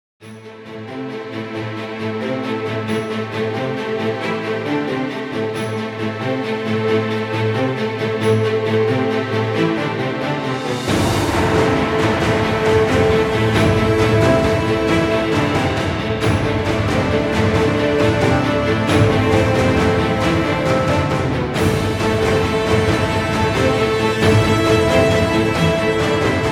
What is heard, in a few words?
Energetic Blockbuster Epic Music